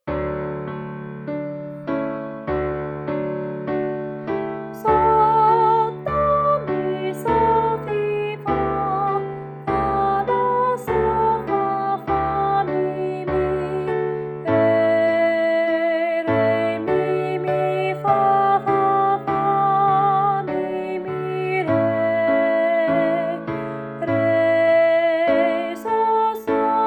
soprano solfege; mozart; ave verum

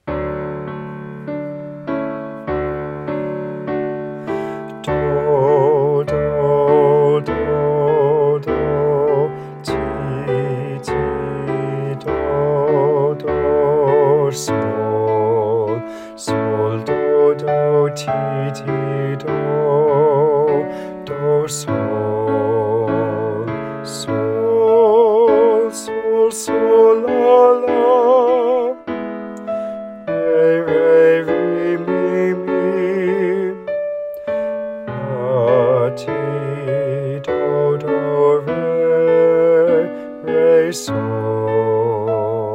Bass Solfege, Solfege with Singers; Ave Verum; Mozart

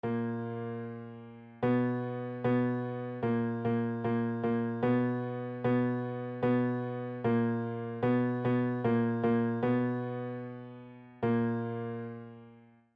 minor 3

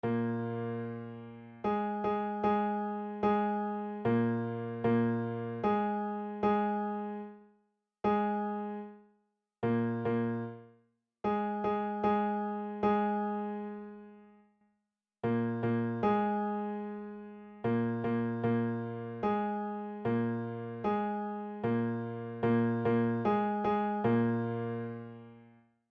minor 3; intervals

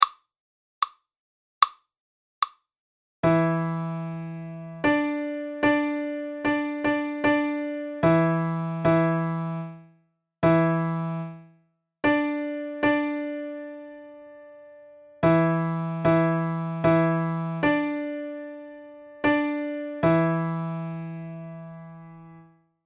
minor triad; intervals

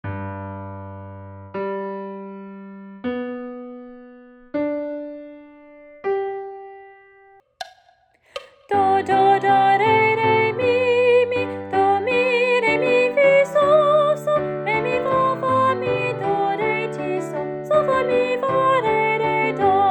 soprano solfege; morley; now is the month of maying

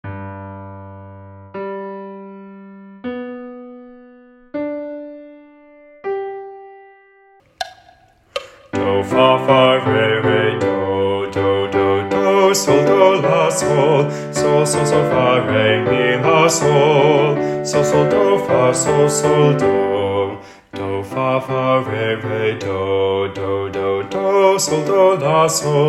Now is the Month of Maying BASS Solfege; solfege with singers

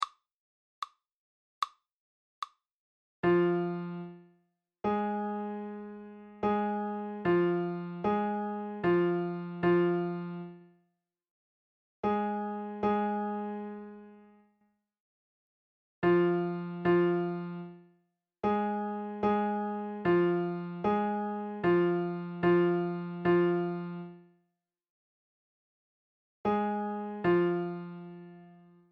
minor 3; minor third; intervals minor 3rd exercise

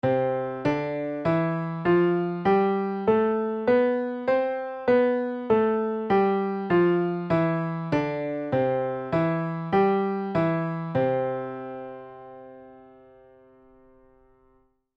tuneup C Major

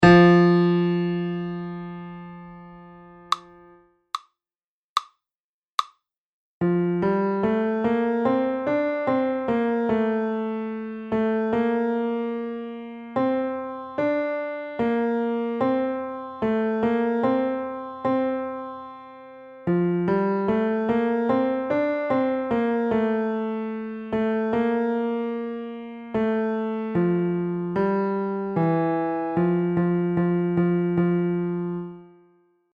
tenor; maine all state auditions

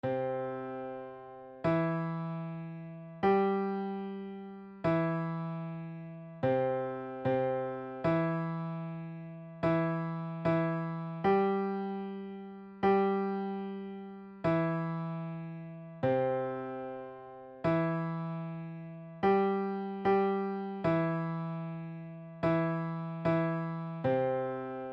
major triad; intervals

